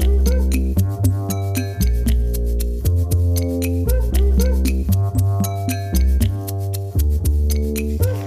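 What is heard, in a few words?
music loading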